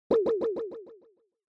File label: Onoma Sigh Delay Mid